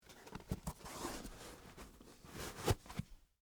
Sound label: Dress Shoe On, Foley, Shoes, Dress, Shoe, Put, On, No, Laces; Dressing Sound Effects